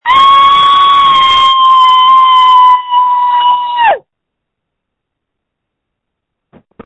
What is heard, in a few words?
screams